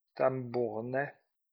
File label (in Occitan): tambornet